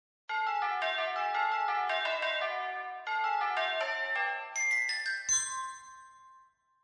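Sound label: bell glock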